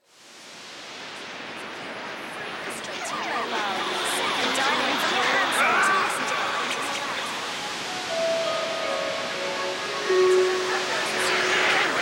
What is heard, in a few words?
siren remix